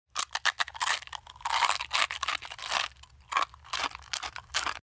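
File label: bites and crunches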